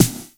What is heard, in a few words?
noisy snare drum d sharp key; snare drum samples; one shots